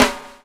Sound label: BUBL, BUBBLE; Spectrasonics - Backbeat